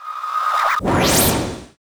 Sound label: quick attack